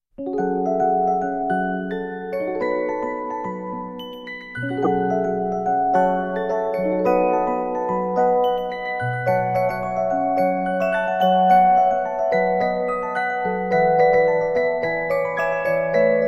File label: Musicbox